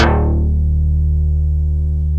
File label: PPG WAVE, OLD SYNTHS; Rubber Chicken Software Sample Bank